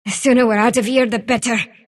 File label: sylph move pain; dota; dark willow